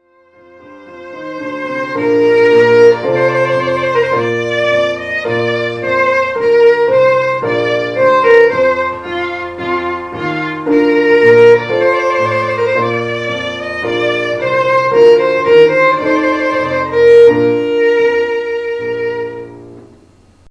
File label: Trumpet Voluntary; Clark